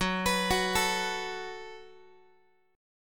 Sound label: Gb Minor Double Flat 5th Gb Guitar; arpeggiated; x,14,14,16,13,x; 4A,4E,5A,5D,5G#,6C#